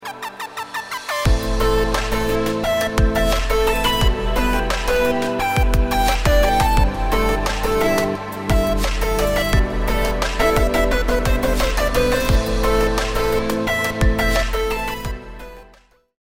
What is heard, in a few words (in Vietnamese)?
Nhac dien tu EDM buon; VLOG tieng vang am tram video nho